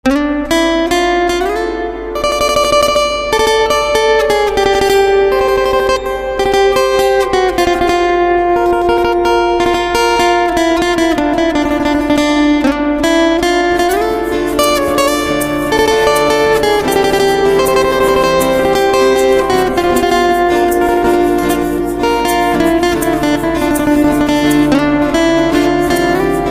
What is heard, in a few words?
Guitar